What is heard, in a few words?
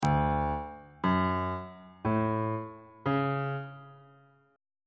d flat phrygian 7th chord 2 is e double flat major 7th on bass clef